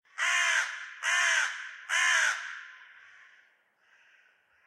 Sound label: crow; minecraft; Remastered; ambient